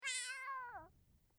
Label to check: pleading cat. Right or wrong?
Right